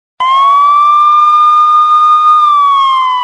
Police siren sound

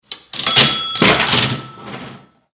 Money cash register sound

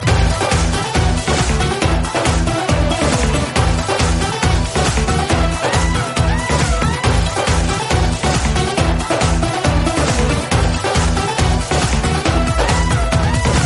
Instrumen

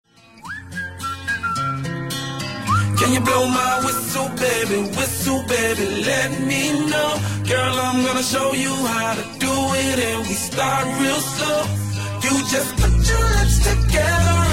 Whistle

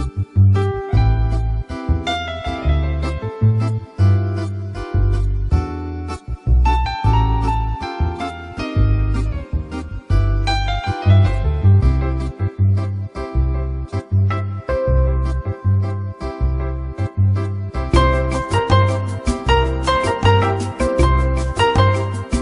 Music Ringtone

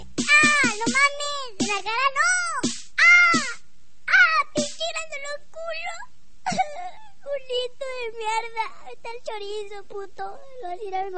Funny mexican laugh

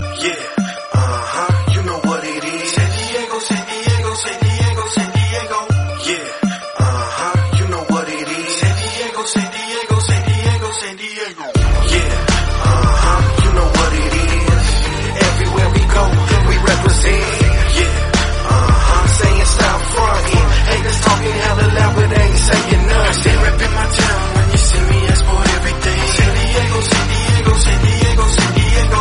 Music box song

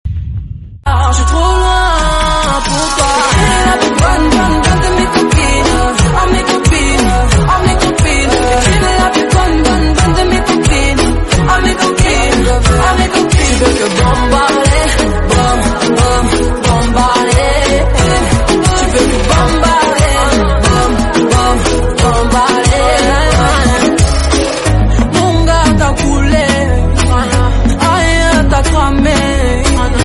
Music

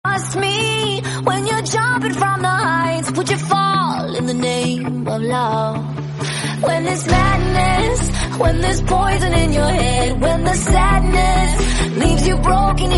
phone music

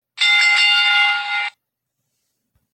Bell Sound; Wwe Bell Sound Ringtone

